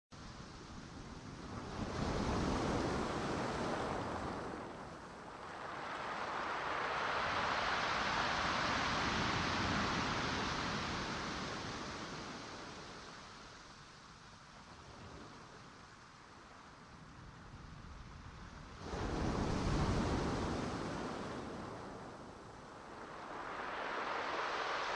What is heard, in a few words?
ocean sound